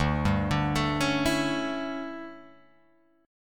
D Major 7th Suspended 2nd Suspended 4th D down Guitar Open G